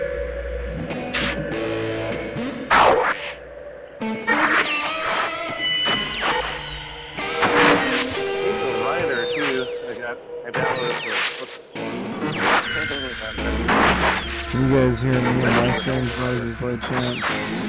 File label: Noises